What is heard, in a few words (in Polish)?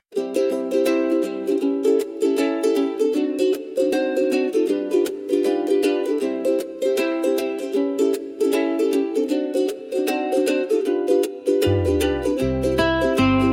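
Muzyka Hawajska; Mele Hawaii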